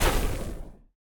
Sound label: Jai Alai Shoot